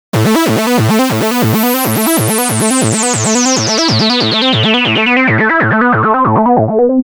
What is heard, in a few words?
Seq 3 osc